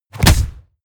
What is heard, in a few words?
stylized fist punch